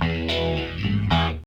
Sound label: menu command